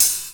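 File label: hi hat, drum; Masterbits - Total Zone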